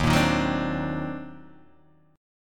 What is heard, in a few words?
Eb Minor Major 13th, Guitar, Open D